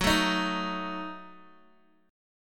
F sharp Minor 6th, F sharp, Guitar, Open D

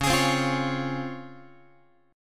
Db Major 7th Db down Guitar; strummed; JGuitar

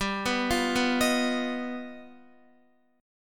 G Augmented 7th, Guitar; G+7 arpeggiated; JGuitar

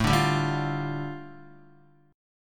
A 7th Suspended 4th Sharp 5th A Guitar, chord; strummed

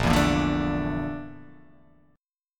A sharp Major 7th Flat 5th A sharp

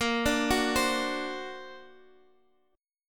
D Augmented 7th A sharp, guitar

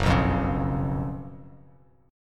Gb Major 7th Suspended 4th Gb Bass Standard; GbM7sus4; strummed; 2,2,3,6; JGuitar

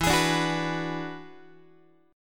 E 9th Guitar; strummed; chord; JGuitar

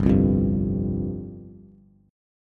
G 7th Suspended 4th G down Bass; G7sus4 strummed

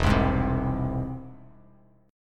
E Augmented, Bass Standard; E+ strummed; JGuitar

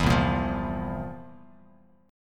C 11th, C, down, Bass Standard; C11 strummed

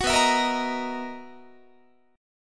B Major 11th B down Guitar; strummed

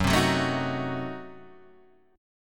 C Diminished 7th F sharp, chord

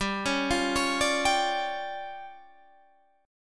D Minor Major 7th Double Flat 5th, G

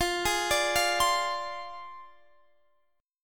D-Minor 7th Flat 5th F, chord

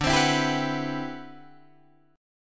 D 13th D, down, Guitar, Drop D

